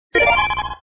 GET ITEM; POKEMON

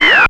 BEEP SWP

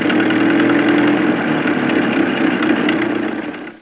Car; DR BRAIN; BUGS

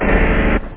TECHNO; da capo vol1; instruments; stabs

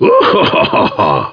haha

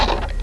detector; Spheres 1.0

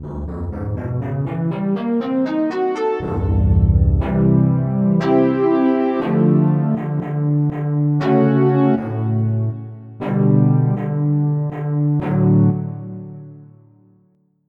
Chiffer Lead; FF7

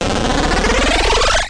VAPOR

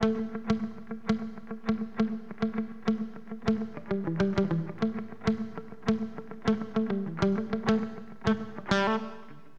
DIRT GT, Acid Jazz Funk, Guitar; LiveAct Pool

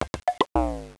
BING; 10,000 Graphics Pack Volume 1; Media Graphics International

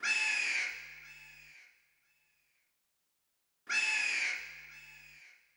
buzzard; Rapid Gun; ipsc

